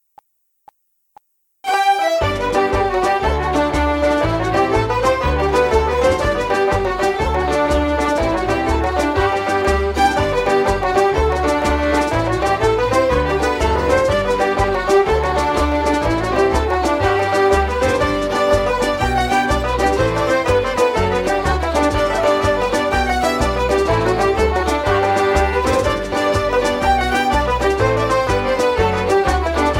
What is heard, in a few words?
Traditional Irish Jigs Medley G